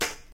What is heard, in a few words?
rich top end snare drum sound a sharp key